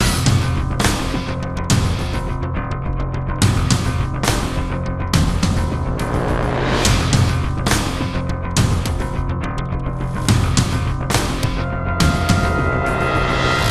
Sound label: FULLBAND INTRO LP; transformers war for cybertron gamerip; IACON IN RUINS